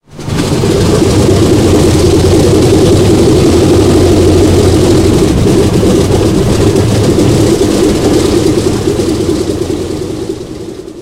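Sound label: engine start cockpit right; War Thunder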